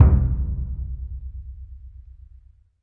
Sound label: big burundi, African Burundi Drums